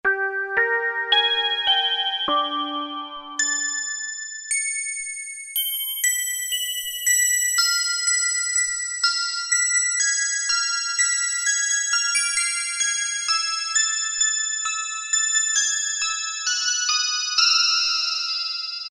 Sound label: Ping Bell, Roland Juno-106; Factory Preset